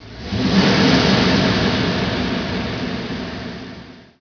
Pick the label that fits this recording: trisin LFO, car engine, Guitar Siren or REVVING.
REVVING